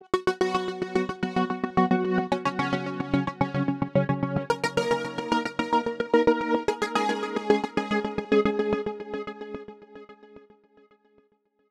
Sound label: 8bit